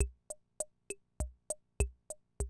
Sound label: CR78, DrumLoops